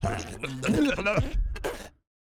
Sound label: javelin throwers death